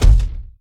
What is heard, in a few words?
Climb Base; Evolve; Goliath